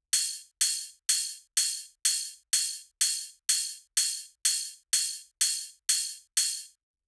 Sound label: Bass House 125bpm Ride; EDM Drums; Drum Kits; Stems; Ghosthack; Ultimate EDM Drums; Bass House Kit 10